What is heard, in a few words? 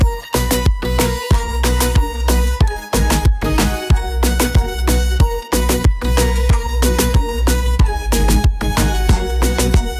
pop music multiband diffusion